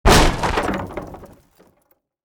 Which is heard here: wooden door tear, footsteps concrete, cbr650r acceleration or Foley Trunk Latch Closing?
wooden door tear